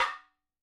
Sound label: madalam notes L; Sample Banks; Percussion; MADHALAM